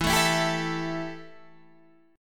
E Minor Double Flat 5th E Guitar DADGAD

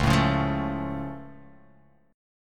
C sharp Augmented down, guitar chord

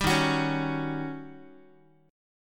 Eb 7th Sharp 9th, Eb, Guitar; Eb7#9 strummed; 10,11,9,11,x,x; JGuitar

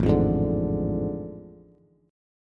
A Major 7th Suspended 4th Sharp 5th, Bass; strummed

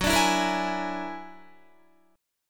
Gb Augmented 9th Gb down Guitar 4E,4A,5D,5G,5C,6F; Gb+9 strummed

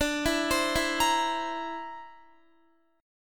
D 7th Suspended 2nd Sharp 5th D, Guitar; D7sus2#5 arpeggiated; x,17,16,17,16,18

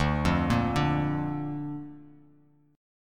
D Minor Sharp 5th D down, Bass Standard; Dm#5 arpeggiated; JGuitar

